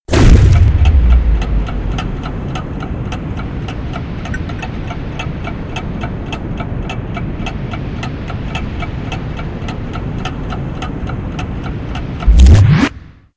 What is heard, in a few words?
slowmo fx; freak fortress 2; hat kid